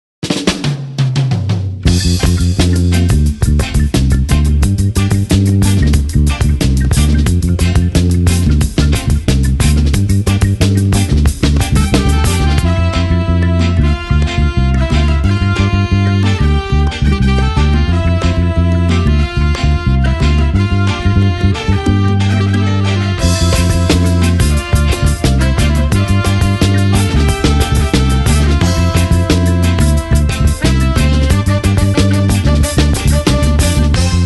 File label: DRUM